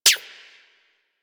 Rifle Mech; Warframe; Weapons; Wisp Rifle

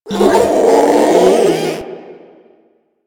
Strike Scream; Enemies; Abilities; Warframe; Proto Infested Ancient

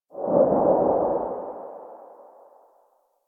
Operator Wraith Void Move; Warframe; Focus; Powers; Wraith Movement

